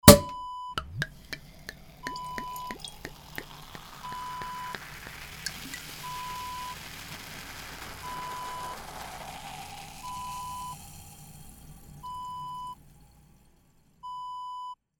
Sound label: champagne pop pour